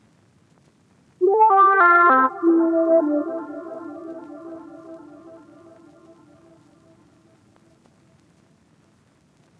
R&B Melody Loop - 100 BPM D Min Lead; Melodies; Loop Stems; Cymatics; Oracle Sample Pack

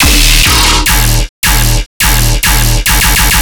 Bass Loop D# 140BPM, Dubstep

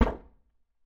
Alien Weapon Shot; Game Essentials; Sound FX Military

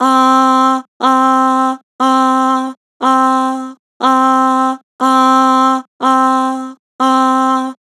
Vibrato "Aah", Vocals; Harmor Vocals

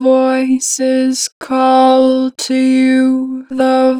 Voices, Harmor Vocals; 2 bar flat; Pitch Flat

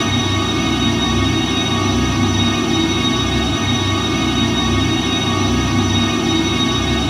Texture Loop, Techno

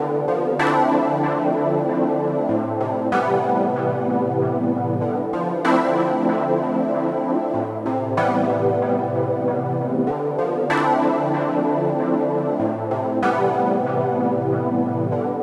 95 BPM A Min OB-6 Chords; Stems; Cymatics; Dystopia; MTRON+OB6

